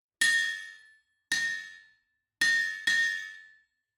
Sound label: ambience room forge working layer